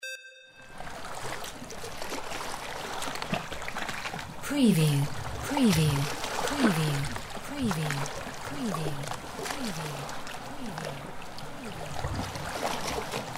SEA ROCKS; windy beach audio

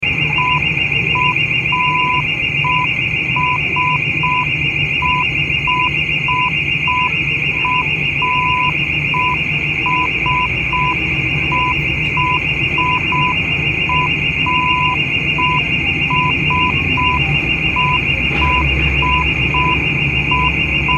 Burglar Alarm In Room

